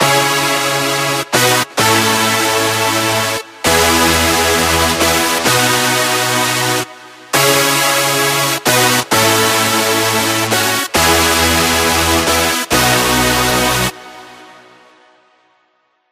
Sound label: CLASSIC BIGROOM